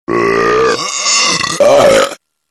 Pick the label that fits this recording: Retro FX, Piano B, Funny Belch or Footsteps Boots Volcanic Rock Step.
Funny Belch